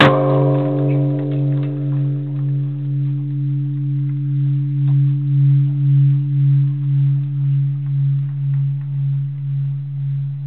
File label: Kurama Bell